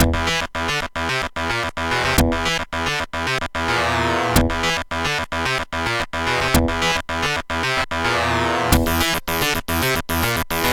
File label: DOOM AWE64 FM normal